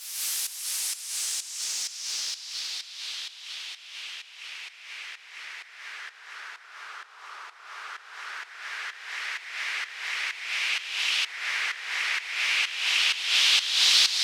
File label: 128BPM Fx Noise; Vengeance; Essential Clubsounds Vol.5; Demo Constr Kits; Mainstage Kit Root Fmin